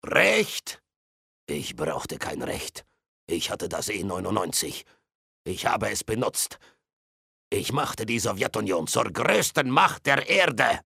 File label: sing